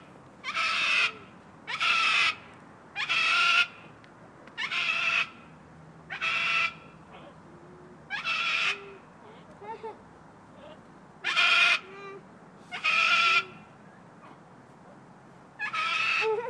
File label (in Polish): Molucki kakadu